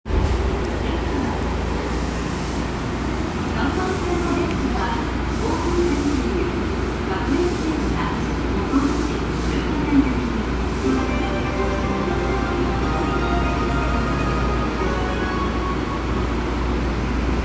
副都心線千川南

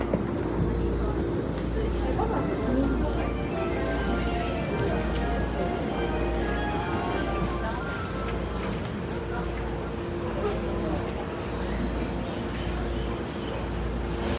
JR武蔵野線越谷東, train sounds

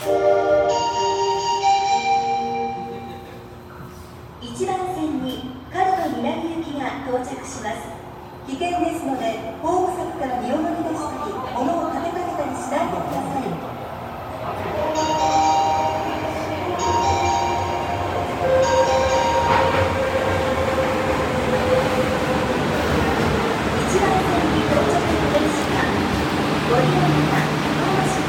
大阪メトロ長堀鶴見緑地線長堀橋東接近

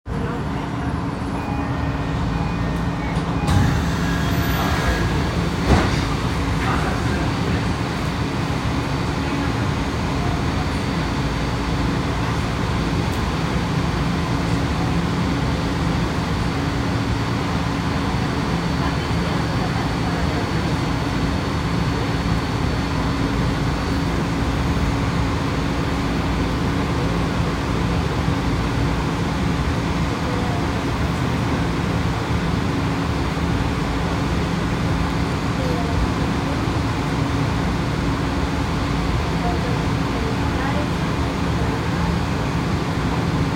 銀座線田原町東, train sounds

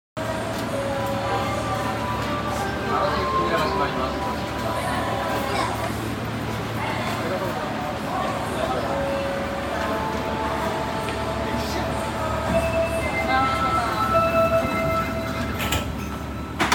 中央線大阪港東, train sounds; 大阪メトロ

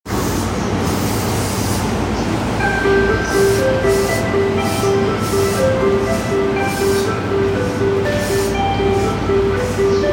JR山手線鶯谷CW

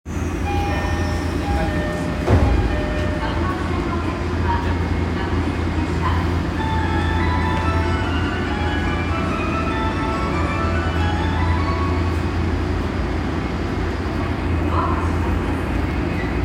有楽町線飯田橋東, train sounds

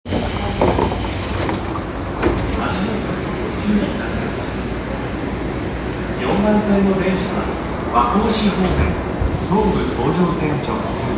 有楽町線小竹向原西 ambience, train sounds

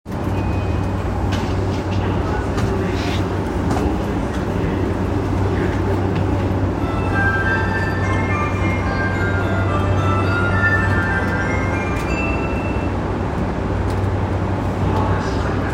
南北線溜池山王南, train sounds

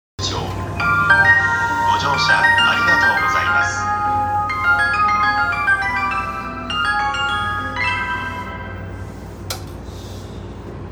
JR 山手線 有楽町 CW, train sounds

